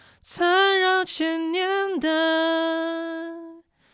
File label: Tenor